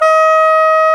SAX SOP MF D, Soprano Sh

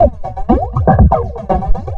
120 DISCO; USB Soundscan vol.20; Fresh Disco House I; AKAI; DISCO ADD

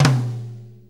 Blues Tom Flam; Sonic Foundry (Sony Creative Software); Loops & Samples Drum Tools; Flams

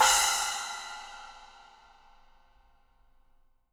10 SPL 2, FX CYMBALS, Living Drums; Sampleheads; Peter Erskine Living Drums

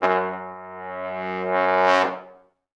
F#1, Pop Brass; Best Service ProSamples vol.19; AKAI

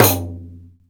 RIQ, ETHNIC PERC; Instruments & Percussions; InVision Interactive; Lightware VOL-1

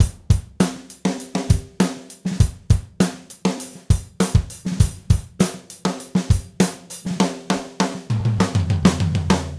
Gretsch groove 90 BPM, 1967 Gretsch Kit drums

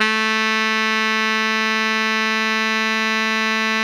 TENOR FF A 3, saxophone; Greytsounds; Philip Wolfe David Crozier's Saxophones

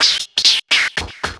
loop, 170 BPM, drum & bass

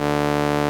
SOLINA, String Machines

